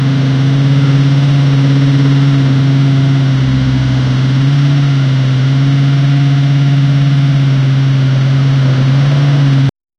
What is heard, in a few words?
tractor engine low rpm